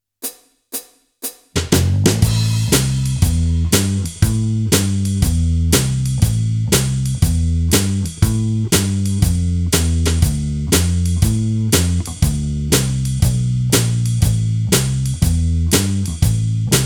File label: Jazz Drum and Bass; PROst N95; Habitacion; reference level demonstration